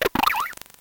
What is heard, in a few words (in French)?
Cri